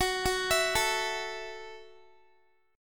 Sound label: F sharp Minor 7th F sharp, Guitar; arpeggiated; x,21,18,21,21,x